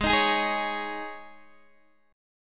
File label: Ab Suspended 4th, Guitar, DADGAD; x,11,13,13,11,11; strummed; JGuitar